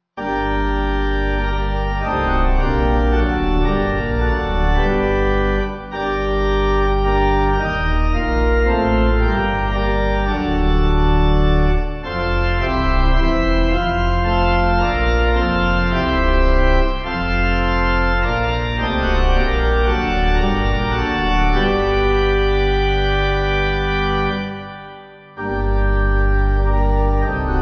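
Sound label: O Christ Who Art Pipe, Pipe Organ; 7/Gm; Tag Und Licht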